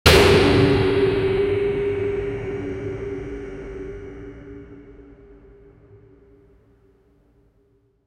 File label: sound effect for platformer